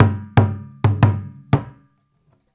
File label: Ethnic Drums, African Contemporary; SUNU; Spectrasonics; Supreme Beats